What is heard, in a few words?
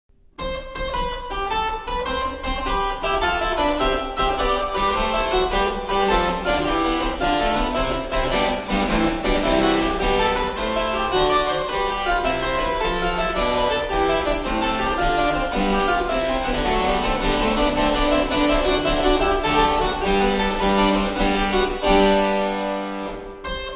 suite in c major